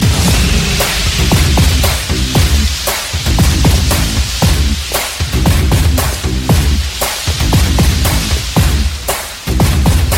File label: Theme Music, Malayalam Ringtones